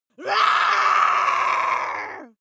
witch scream scratchy long

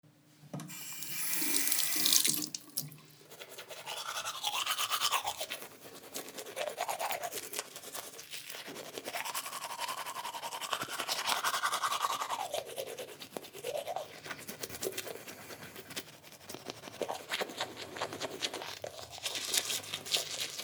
brushing teeth

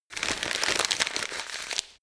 paper crumple

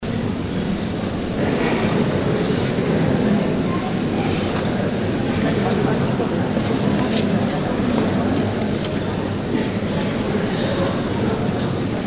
hall with much whisper

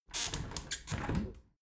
window open regular sequence

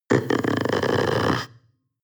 panther young grunt deep

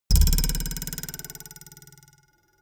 ruler twang

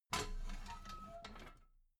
metal ladder small handle unfold soft

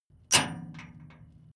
metal chain impact container

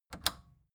record player power click off fast

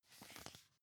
magazine flip page

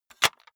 cassette case close hard

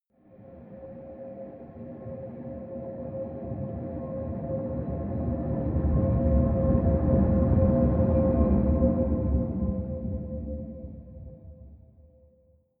train locomotive drive by distant

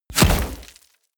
bullet small impact wood scatter

